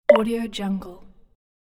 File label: back button